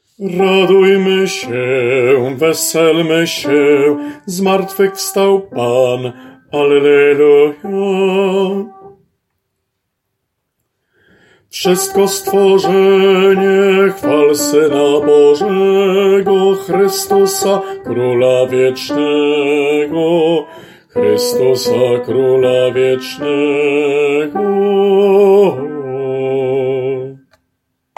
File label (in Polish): BAS; Radujmy sie